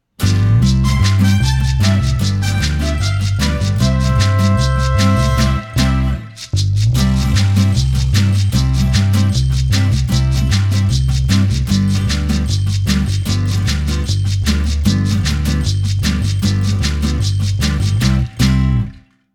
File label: Tum tum pisca karaoke